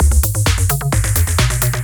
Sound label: groovebox 130; maudio; Elektron